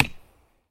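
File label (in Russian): ритмичный негромкий стук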